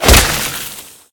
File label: large impact; smite; thanatos